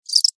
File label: Insect Cricket Chirp Single; Insect Voice; Animals; Insects